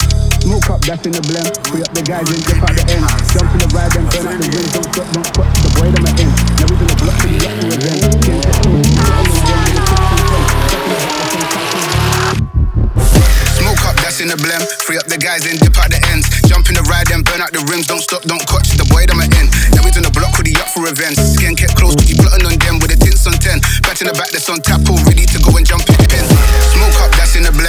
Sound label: DRILL